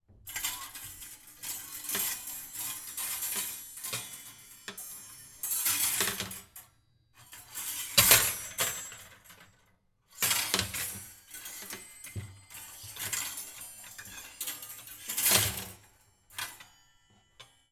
Household, Wardrobe, Hangers, Movement, Hotel Room, Vietnam